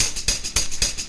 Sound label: tambourine, drum loops